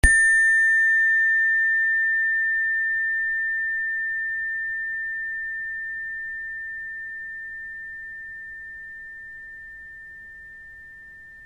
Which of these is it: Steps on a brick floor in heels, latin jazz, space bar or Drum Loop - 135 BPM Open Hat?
space bar